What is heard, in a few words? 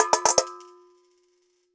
Coin On Collander